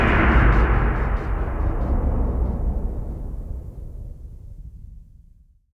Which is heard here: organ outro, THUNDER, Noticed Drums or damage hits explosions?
THUNDER